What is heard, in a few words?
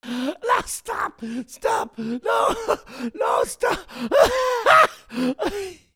PEOPLE